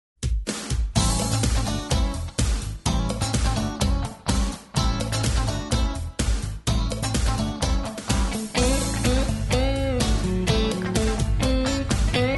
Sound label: funky cowboy, square dance music